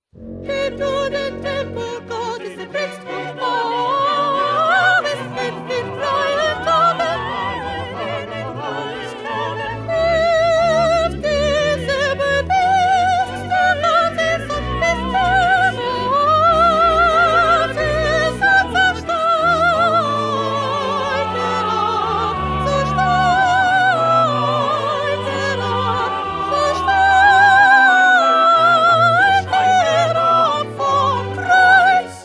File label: 2nd Soprano